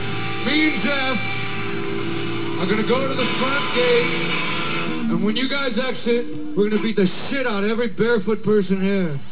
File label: beat